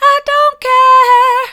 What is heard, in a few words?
i don't care soft, vocal